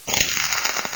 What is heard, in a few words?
electrical crackle